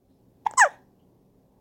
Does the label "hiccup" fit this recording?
Yes